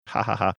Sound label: hahaha